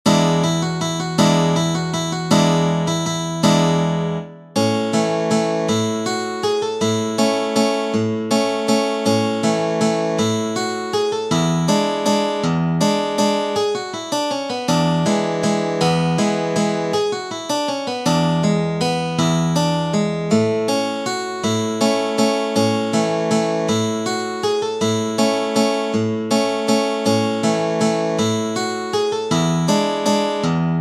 Waltz

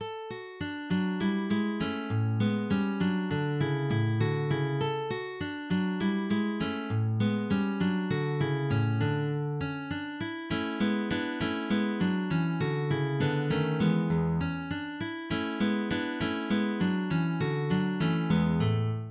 scherzo; Ferdinando Carulli; grand recueil

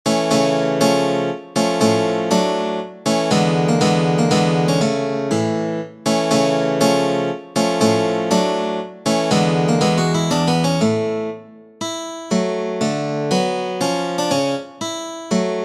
Larghetto, guitar; Carlo Munier; Bellini

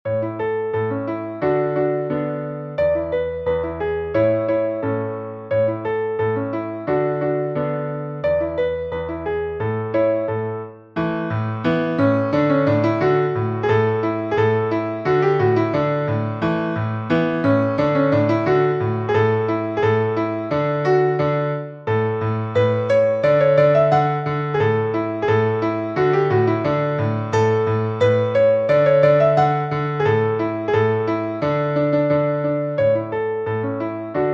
polka

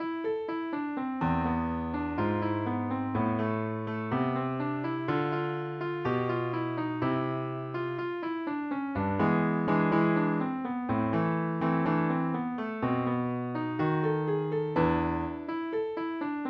tango

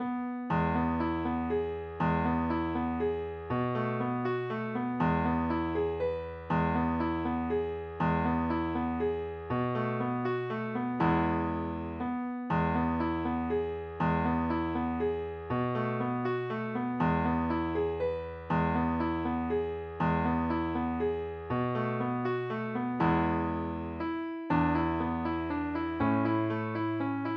guitar; waltz facile

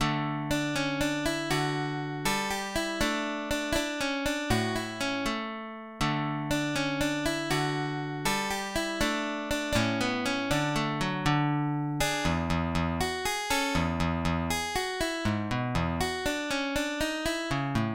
Rondo Allegretto, guitar

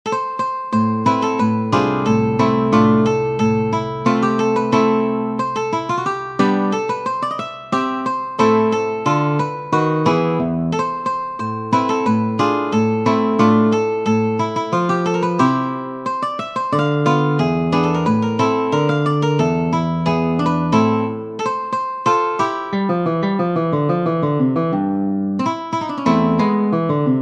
Gavotte, guitar

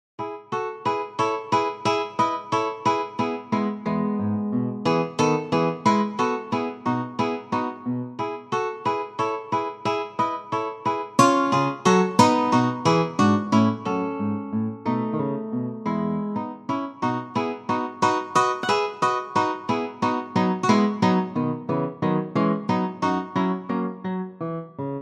Prelude, guitar

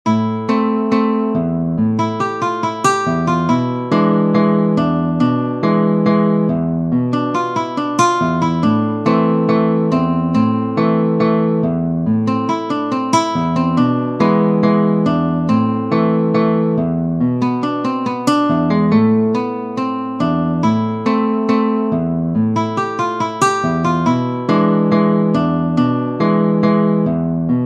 tango, guitar; Attilio Bernardini; Duque

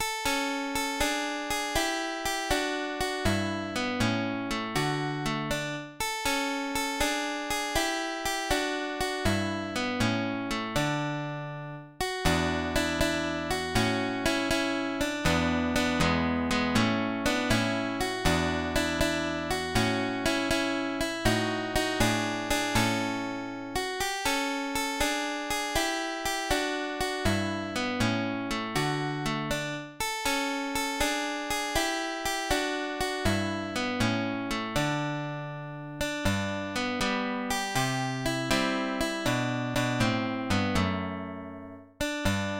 Rondino, guitar

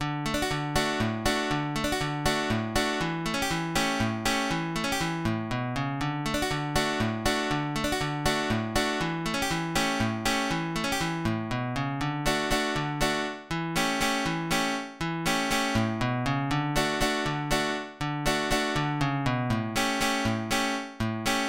Zamacueca

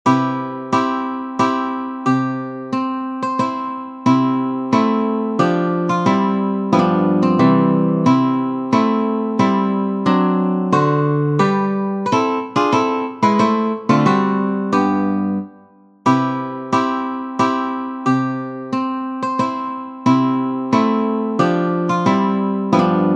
andante, guitar; Fernando Sor

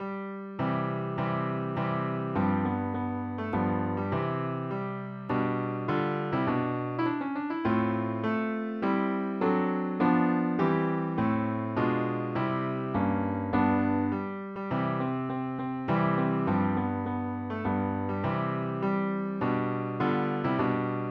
guitar andante; sonata

